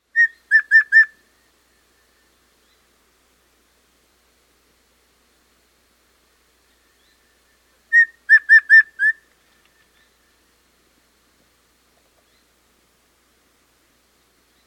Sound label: Bay Antpitta, S. America